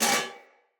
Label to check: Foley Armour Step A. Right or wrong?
Right